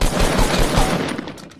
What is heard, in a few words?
assault rifle